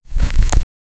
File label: i need the sound of, fire